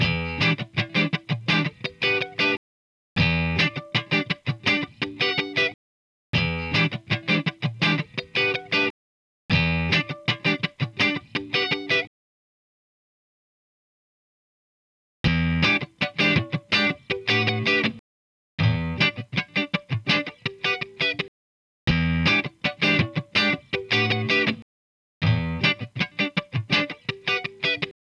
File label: listening exp lacis sound